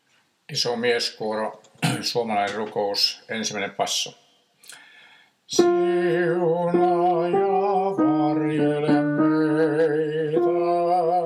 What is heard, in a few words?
basso; mieskuoron